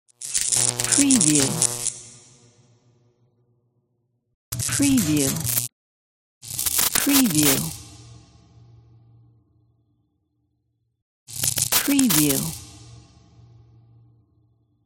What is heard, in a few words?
glitch intro logo